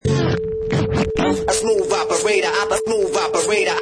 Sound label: Vinyl Scratch 95 bpm